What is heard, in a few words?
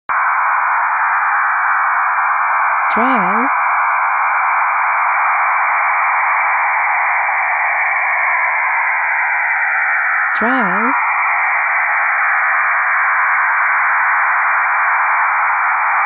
utility fax 3855 kHz; pinneberg